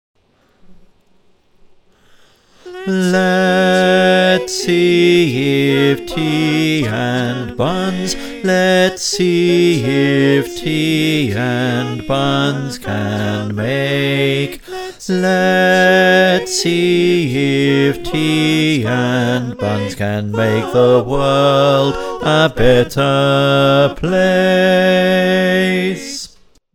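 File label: Bass, choir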